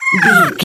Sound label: goblin fairy hurt pain